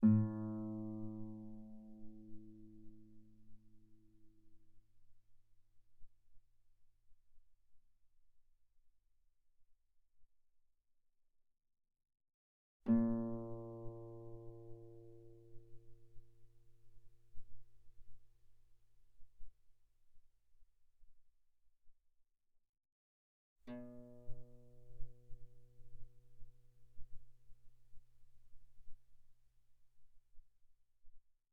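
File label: Guitar pianissimo sul A A2 B2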